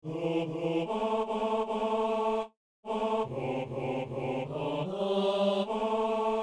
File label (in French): basses; Psaume 92 (93)